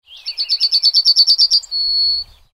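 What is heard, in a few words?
yellowhammer slow low strophe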